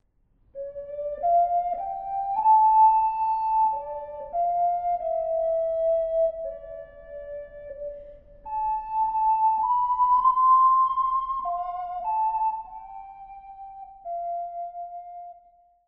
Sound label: Oh Heiland, ocarina